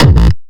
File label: bass kick